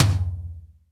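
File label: tom, drums; Best Service; Real Mega Drums VOL-1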